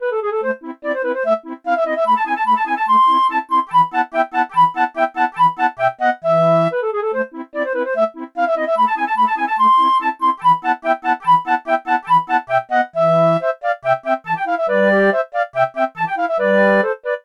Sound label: flute